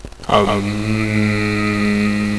dark idle

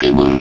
strike

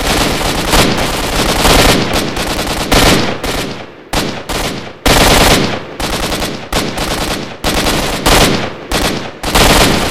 gunfire

